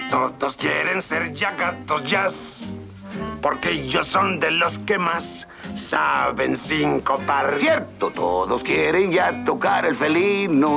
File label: jazz; aristogatos